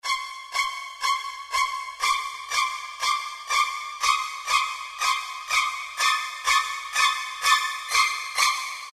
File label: Psycho Strings; Roland PN-D50-01